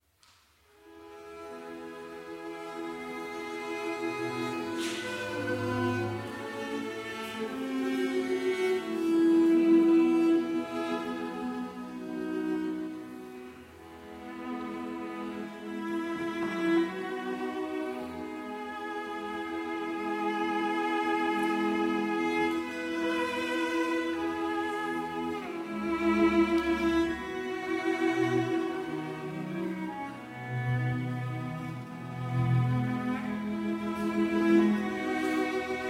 Arpeggione Sonata in A Minor (Arr. For Arpeggione & Orchestra) II. Adagio; D. 821